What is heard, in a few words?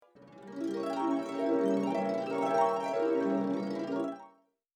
harp gliss